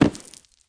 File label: Bull Digs